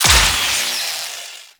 Osprey Malfunction; Warframe; Corpus

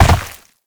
Land Dirt, Movement; Warframe; Uriel

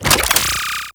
Charge Gun Charged; Warframe; Corpus

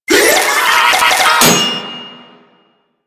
Proto Infested Volatile Runner Explosion Warning; Warframe

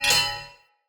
Curved Sword Whoosh Accent; Weapons; Swords; Sinecure

